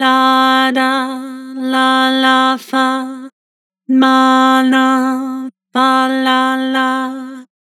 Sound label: Vibrato Multi, Vocals; Harmor Vocals